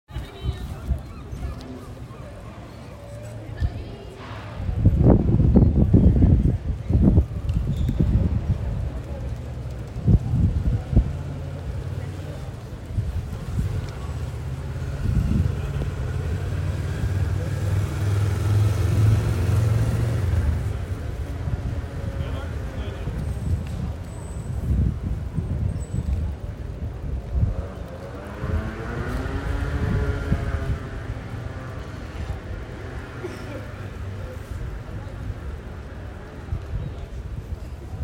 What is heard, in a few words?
Street Rotterdam + wind; Rotterdam Field Recordings